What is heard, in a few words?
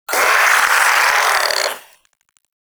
Shadow Vocal Attack; Warframe; Duviri